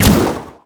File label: arrow launch; weapons; dota; mirana